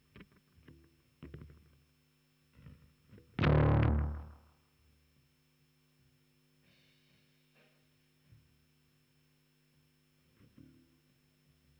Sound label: Bass DI